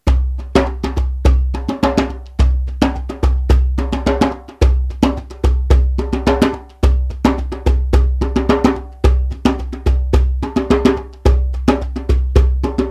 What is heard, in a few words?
Djembe